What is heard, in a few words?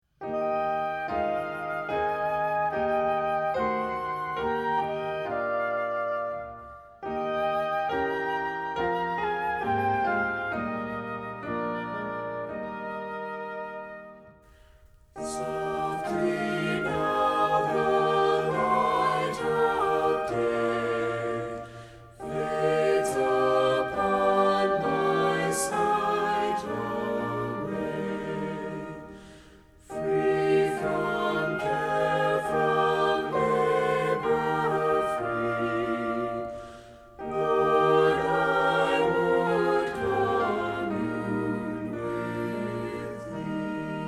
vocal and instrumental, hymns; softly now the light of day